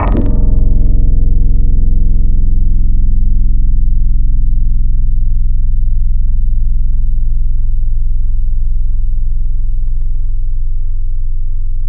Hit, Tropical Mallets; Synthetic's Serum Collection; SparkPackers

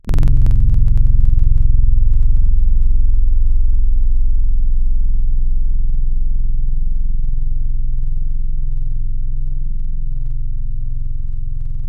Piano Bright; Synthetic's Serum Collection; Surge Sounds - ASTRO - Serum Wavetables

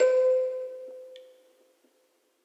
Music Box C; ESW Floom V.1

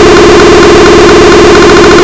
Phone Call; lolicore

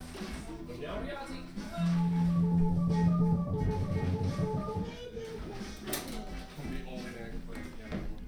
Brother Messing With Organ

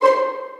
Pizz High C5; Aubit; Louv Vol. 1 Noises

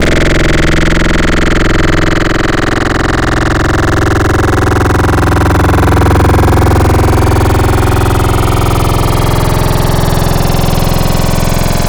FM angry robot; Synthetic's Serum Collection; Echo Sound Works Core Tables

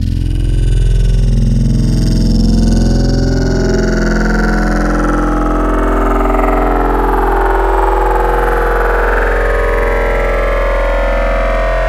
Growl Outer Space; Wavetables; Synthetic's Serum Collection; ESW Core Wavetables; Echo Sound Works Core Tables

